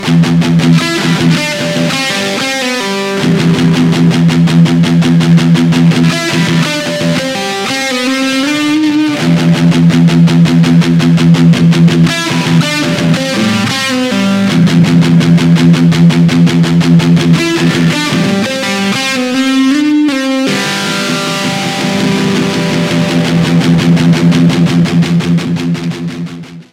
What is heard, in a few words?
destruction guitar ringtone